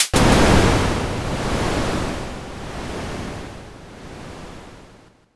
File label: thunder